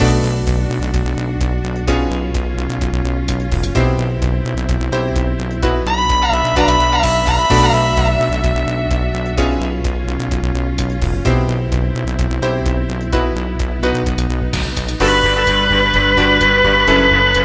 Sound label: fake Princess